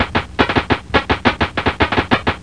static

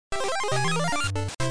mail; smb3

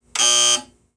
negate; Imperialism Demo

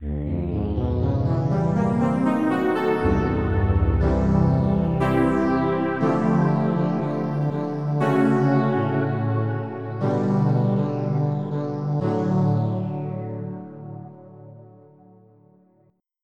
Halo Pad; EverQuest

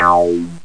FM Bass; SynBass

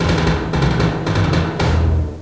drum intro; MacAttack

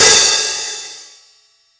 Open Hat; Pro Sounds Sample Collection; Drums And Percussion

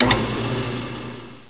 click, Robots; Gunlok

